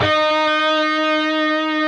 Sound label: PRS E, PRS LEAD, Guitars; Spectrasonics; Hans Zimmer Guitars VOL-1